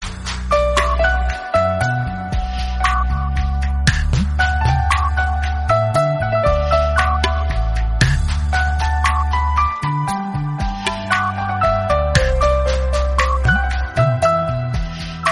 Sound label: flute